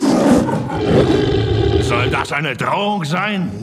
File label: roar deutsch; bluray; mufasas roar